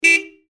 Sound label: horn